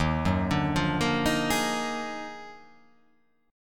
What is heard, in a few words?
Eb Minor Major 11th; EbmM11; arpeggiated; JGuitar